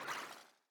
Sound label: circuit upgrade